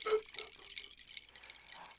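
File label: Music Village Calm Near; From Dust; PS3; Xbox 360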